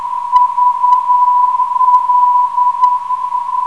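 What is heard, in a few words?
VL 318kHz, non-directional beacon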